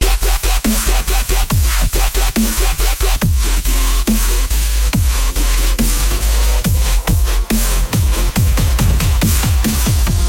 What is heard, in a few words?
electric heartbeat